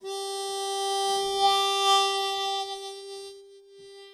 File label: harp A1-G1; forwards